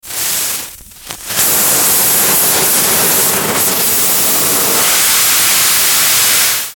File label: static glitch; Static Glitch Distortion Hiss; Production Element Imaging Element Accent Transition; Blastwave; Static Sound Effects